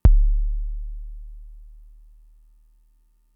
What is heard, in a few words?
kick, Fricke MFB-522